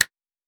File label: Recorded Percussion